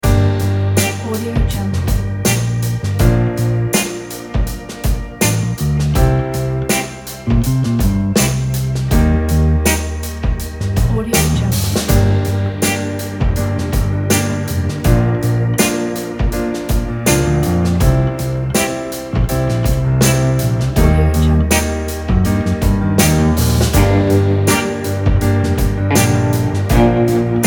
soul

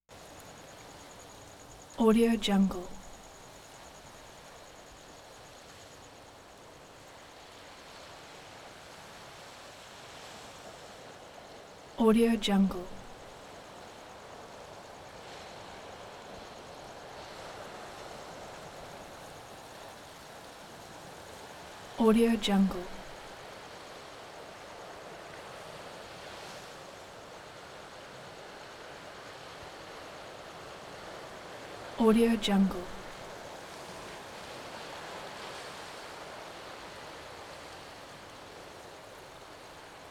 wind active busy leafy blustery

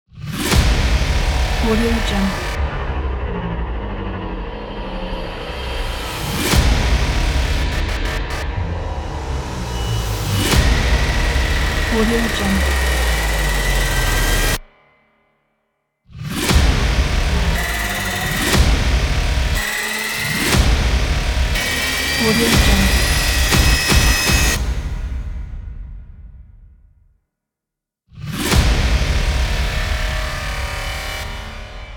cinematic aggressive hybrid trailer

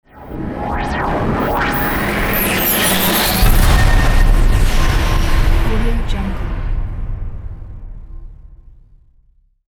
impact logo

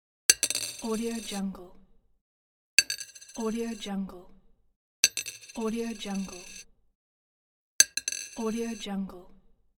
coin falling

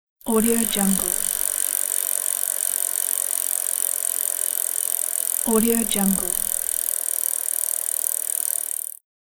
bicycle pedal

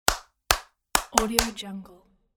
cheerleading single person five short claps; applehillstudios

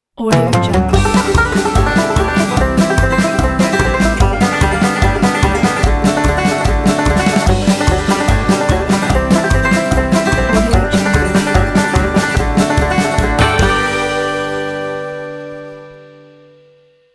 country banjo intro ident; robert-kincaid

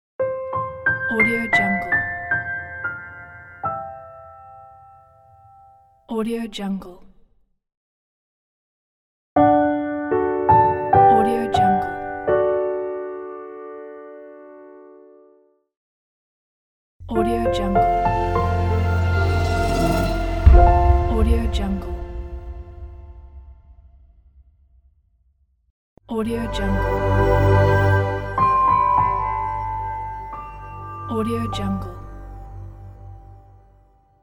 piano logo pack; fox-production

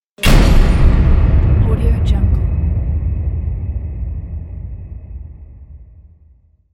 it is detonate

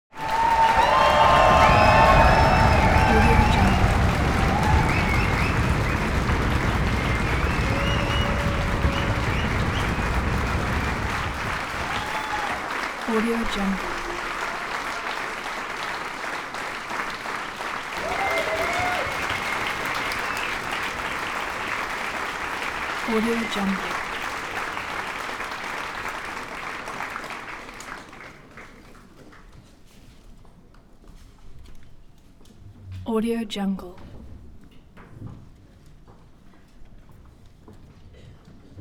human crowd applause medium audience aula front; smartsoundfx

